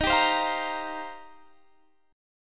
D sharp 6th Add 9th, D sharp, down, Guitar, Standard; D#6add9; strummed; capo=2